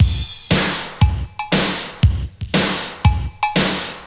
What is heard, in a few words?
118 4-4, Hyper Dance loops; Best Service